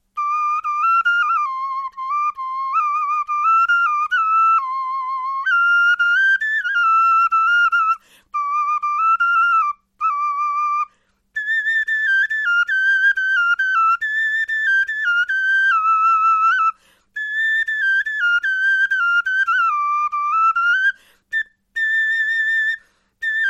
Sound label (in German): melodie